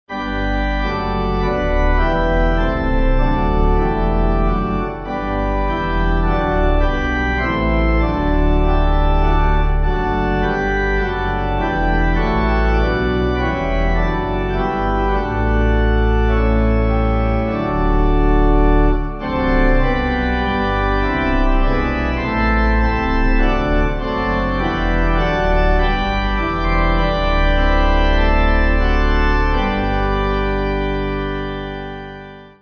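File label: Join All The Glorious Pipe, Pipe Organ; Eastview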